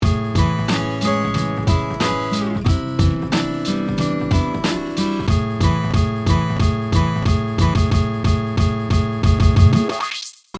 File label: easy break